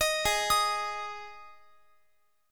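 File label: A flat 5th A flat Guitar, chord; Ab5 arpeggiated; 4E,4A,5D,5G,5C,6F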